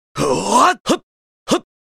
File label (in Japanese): 「ほぁー！はっ！はっ！」; スキル（ディバインコンボ）